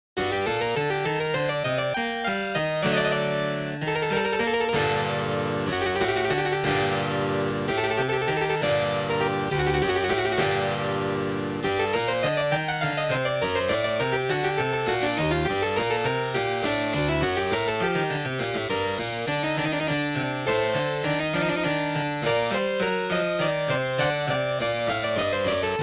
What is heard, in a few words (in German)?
tanze fur clavier es dur; bremische tanze fur clavier vii